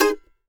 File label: MANDO G# MAJ L; Sampleheads; Whole Lotta Country 1 & 2